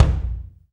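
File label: BD, kick drum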